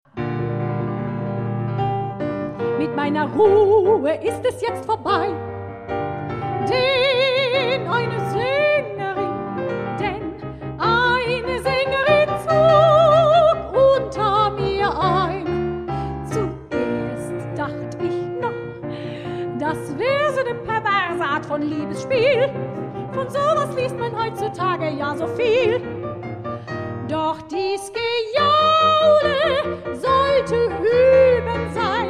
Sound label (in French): Chanson